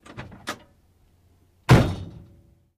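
download Sound, Chevrolet Corvair, Trunk ( Bonnet ) Lid Open and Close. Close Up.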